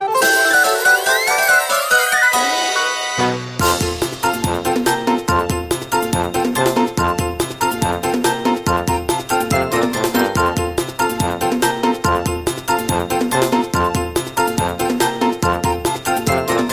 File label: Yay! Goal!; Marble Saga Koroinpa